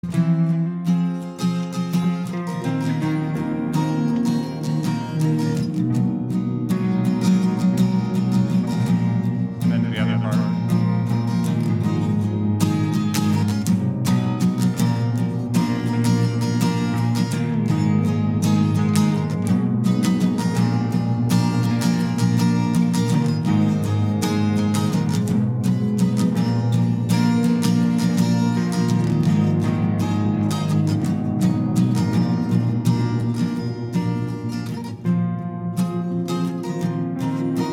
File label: acoustic, capo